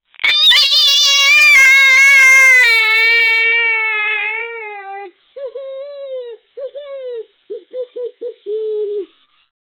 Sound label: child crying